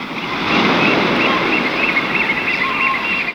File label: SEA, SEA-WATER; Best Service - XXL Production Effects